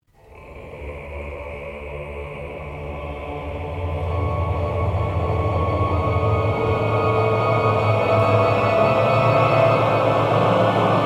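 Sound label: Cemetery, music; Drustvar; Barrowknoll Cemetery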